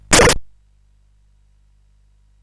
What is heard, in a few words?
sid CSG8580R5